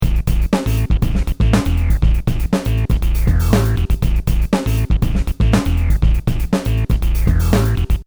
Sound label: synth bass